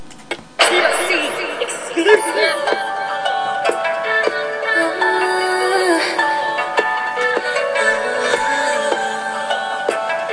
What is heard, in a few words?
rap